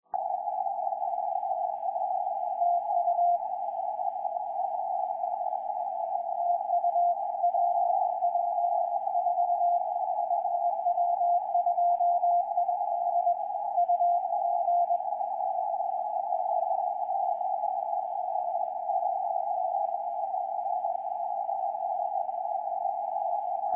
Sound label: CW; PJ4; W2ID